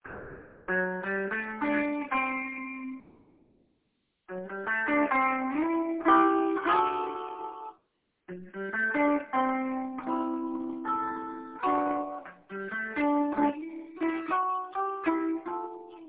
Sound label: nautical party melody